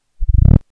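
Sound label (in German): Fall Röhre 10x10cm; über Induktion (Fallröhre); freier Fall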